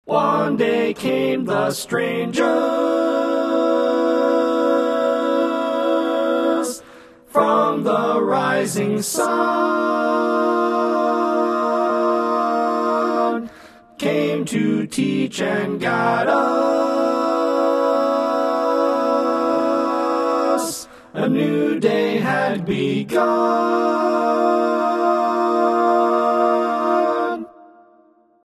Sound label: Strangers A Capella